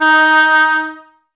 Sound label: OBOES D#; Miroslav Vitous